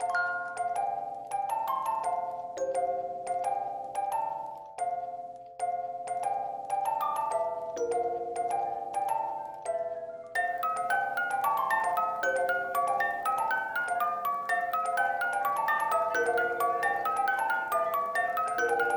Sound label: Jack In The Box Theme; Lethal Company